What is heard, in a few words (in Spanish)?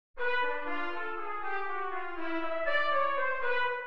Maj7 inv0 en C